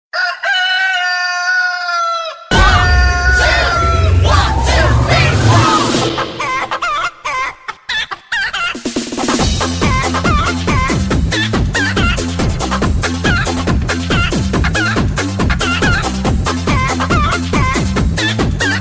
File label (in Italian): Gallo